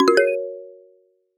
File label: cypher alert